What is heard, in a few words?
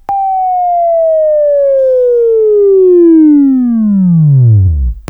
Buzz; Labview-Program; Sweeping Training program